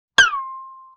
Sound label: Violin Pluck Slide; Vintage Cartoon; Musical Elements